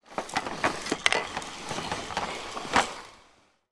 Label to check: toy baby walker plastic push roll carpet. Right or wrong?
Right